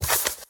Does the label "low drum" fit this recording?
No